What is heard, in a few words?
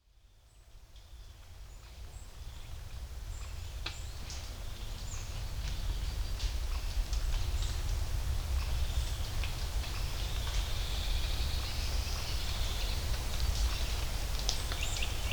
birds morning woodpecker jay; kanzel; deer hut; morning birds at the deer hut